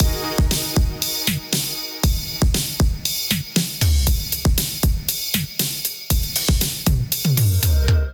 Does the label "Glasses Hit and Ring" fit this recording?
No